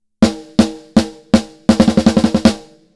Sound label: PUNCH; Oxygen; Wet 100; CONTROL 100